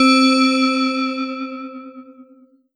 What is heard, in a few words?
Ensoniq ESQ-1 Wave Bell C4